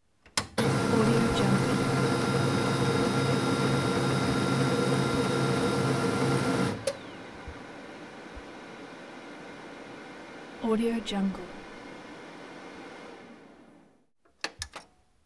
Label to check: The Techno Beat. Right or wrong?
Wrong